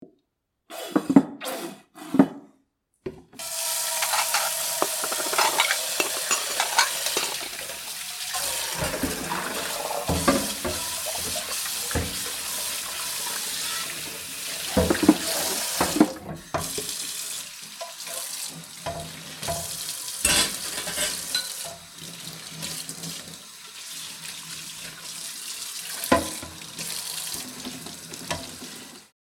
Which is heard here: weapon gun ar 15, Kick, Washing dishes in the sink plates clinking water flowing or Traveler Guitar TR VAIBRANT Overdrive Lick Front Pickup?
Washing dishes in the sink plates clinking water flowing